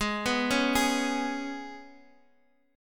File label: Ab Minor Double Flat 5th Ab, Guitar Open D; down